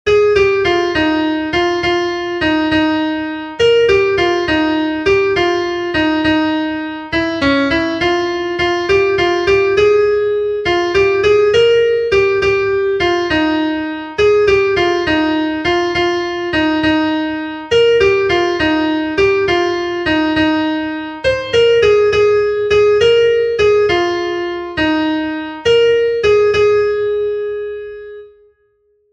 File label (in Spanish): SOPRANO, himnos en piano; cantos del camino